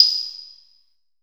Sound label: SYN BEL, FX Synth Bell; AKAI S6000 CD-ROM - Volume 5